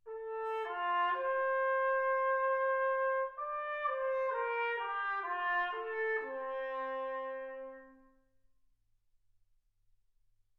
trumpet

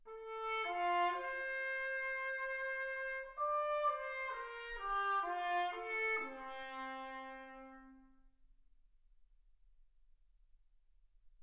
trumpet

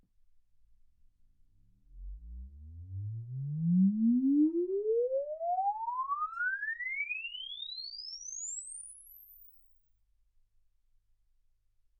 exp swept sinus 10Hz 24kHz

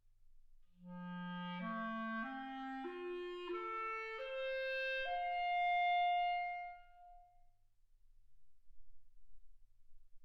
clarinet

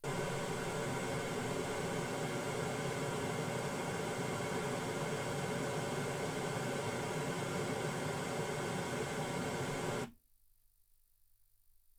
pink noise 48kHz

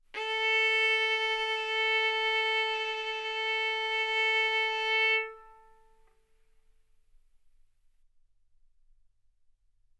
Violin arco mf sul A A4 B4 stereo

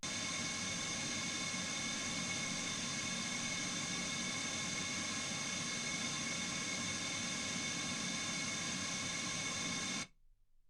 wgn

